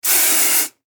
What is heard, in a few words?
Household Hairspray Single Spray Aerosol; Bathroom